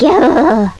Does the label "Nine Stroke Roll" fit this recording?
No